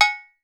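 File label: frying pan, metal bells; Voice Crystal - Steve Reid Definitive Percussion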